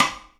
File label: sidestick second line hard; Percs